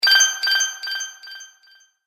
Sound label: Magic Purchase Delay Ding; Cartoon Sound Design Sound Effects